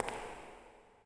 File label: footsteps echo